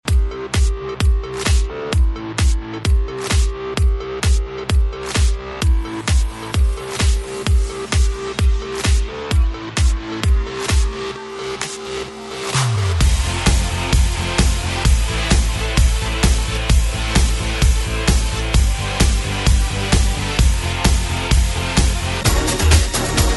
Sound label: Club Style